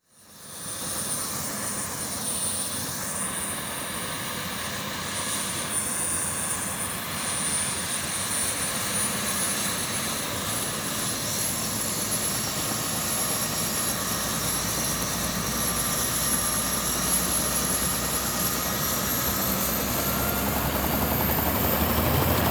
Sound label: helicopter starting